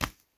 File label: Grab Item